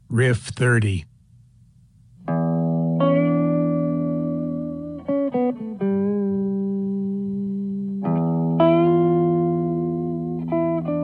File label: Riff